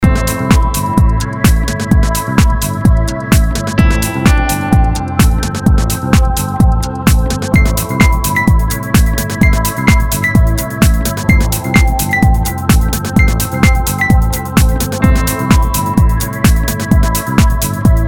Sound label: Piano Funk Club Mix